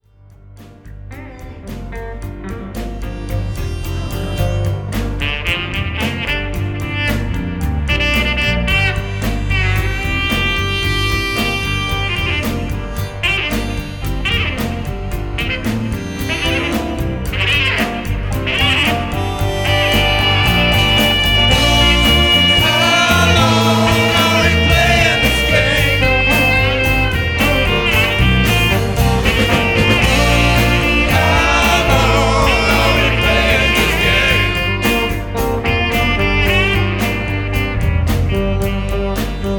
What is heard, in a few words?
sax; rock, live